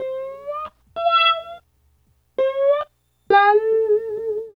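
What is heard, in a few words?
GUITAR 2; East West; Phat & Phunky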